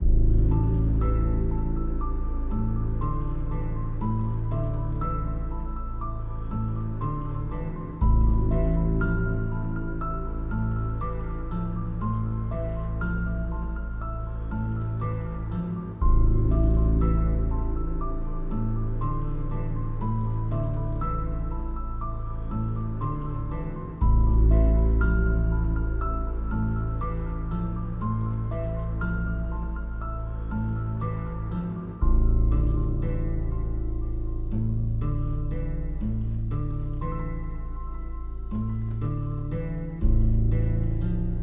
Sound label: temple basement loop 60bpm; wieldertemple; chicory a colorful tale; ps4